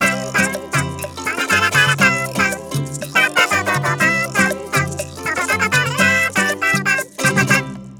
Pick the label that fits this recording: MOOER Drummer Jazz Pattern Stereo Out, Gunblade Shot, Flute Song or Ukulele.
Ukulele